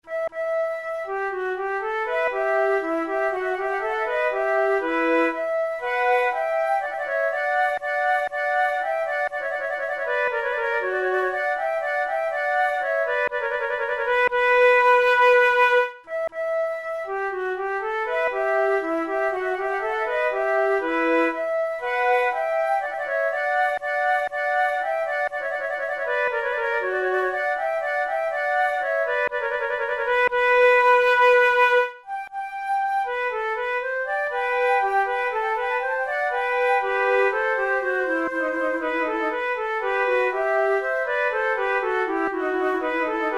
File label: allemande, flute